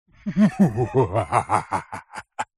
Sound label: human male middle aged laugh slightly sinister